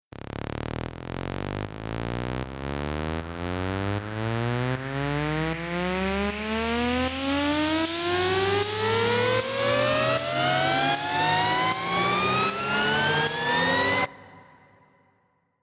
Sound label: Future Bass FX Riser Saw